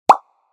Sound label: pop reverb